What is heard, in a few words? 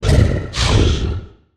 Humanoid Fast Grunt; Monster Sounds; The Decline Of Warriors; Monster Sounds Volume II